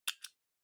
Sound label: Stopwatch Mechanical Reset Hand